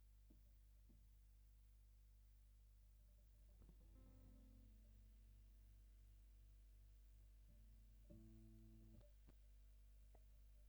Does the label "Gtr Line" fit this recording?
Yes